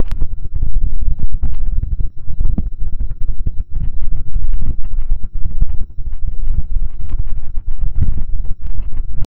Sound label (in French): un profond craquement de, earth